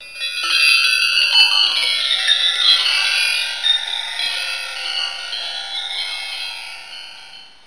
Start Windows; RESURRECTION

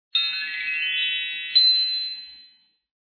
sound Power Up; sumo snowman

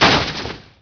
COLLIS, Prangs

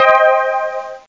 jud maj chorale

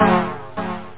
Oral Synth; Instruments

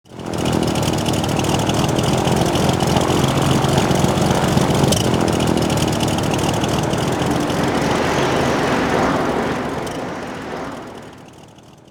flyer, ambient; InventorLabs - Transportation

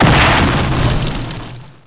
Phoenix Hit, Weapons; Heretic II Demo

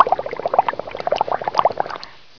SPRAY; Screen Wreckers

